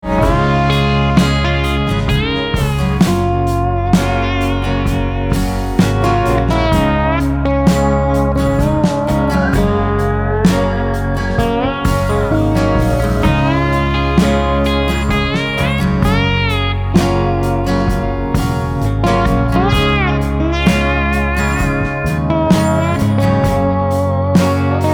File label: Americana Slide Guitar